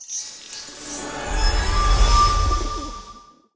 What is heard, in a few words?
fairy Throw Wild; fairyhansel